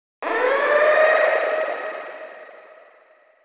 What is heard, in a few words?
death; Alien Arena